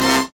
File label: HIT Band, Big Band Hits